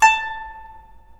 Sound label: GUCHIN A4; INIS - Opium